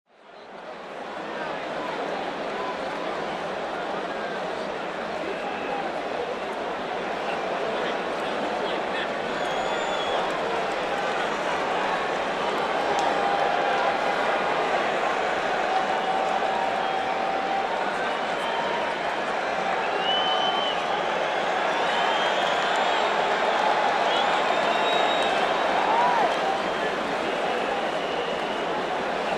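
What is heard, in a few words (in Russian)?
звук толпы на стадионе; Звуки футбола; Звуки игры в футбол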